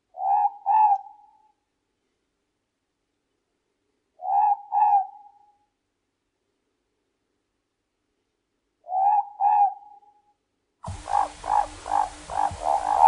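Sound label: Morepork, Bird Calls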